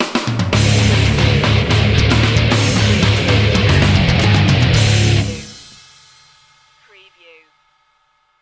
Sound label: disturbed skater sting